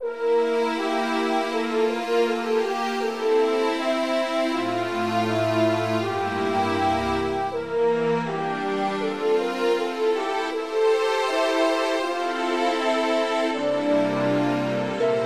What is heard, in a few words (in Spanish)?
harmonizacion